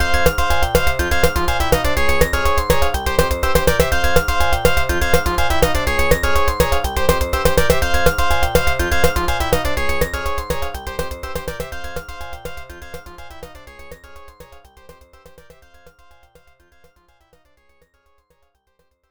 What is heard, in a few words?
Pinch (Quiz BGM 4); quiz tonosama no yabou arcade